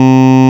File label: vvox, Digital Synths; Ableton Foundation FreePacks